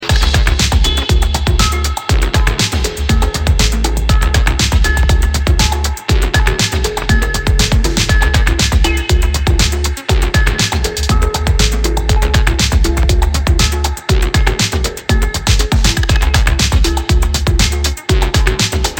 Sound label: Fast Reversed